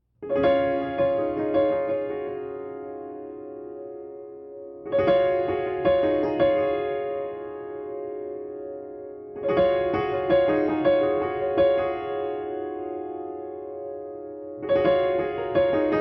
Improvisation

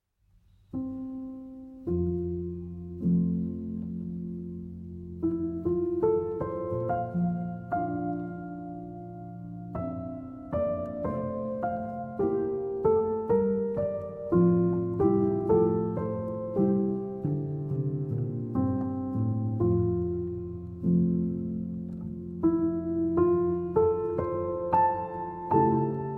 Upright Piano

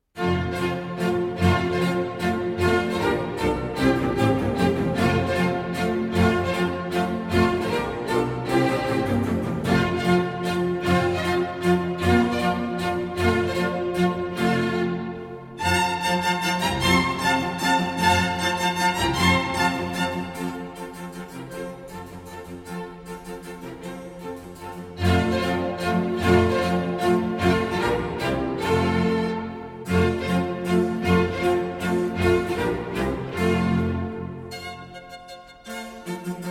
Violin Concerto in F Major, Allegro; Op. 8 Violin Concerto No. 3 in F Major, RV 293, L'Autunno. III. Allegro

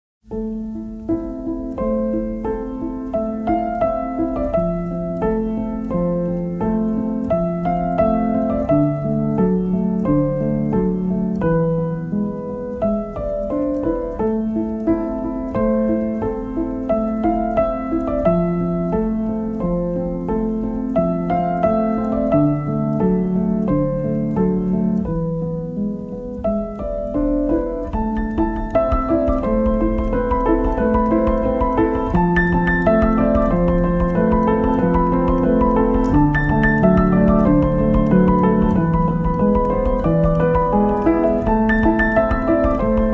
Solo Piano

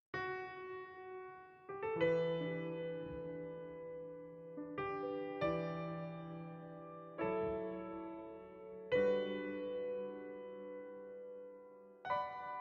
Smooth Jazz Band, Soothing Piano Music

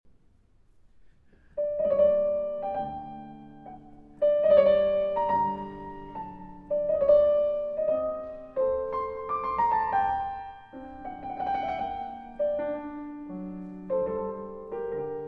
Keyboard Sonata in G Minor

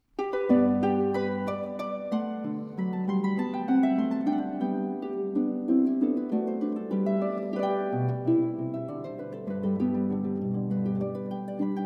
Harp Sonata in G Major II. Allegro

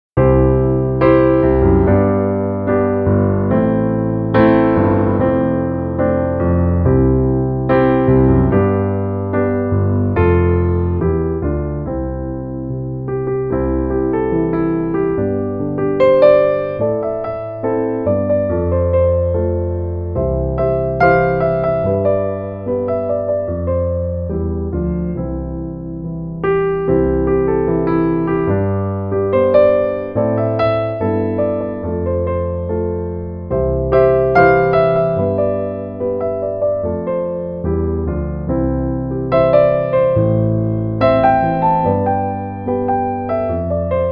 (Instrumental Piano)

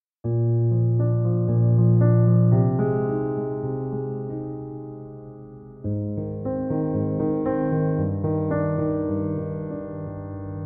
Piano

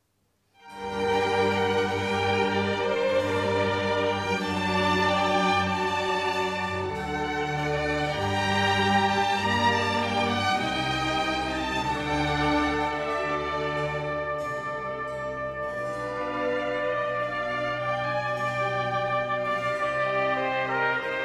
Oboe Concerto in B-Flat Major; Albinoni; (No. 3 from 12 Concertos à cinque, Op. 7) II. Adagio

